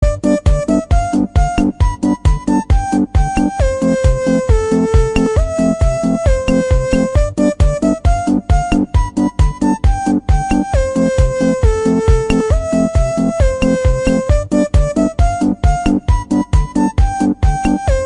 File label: cat; maxwell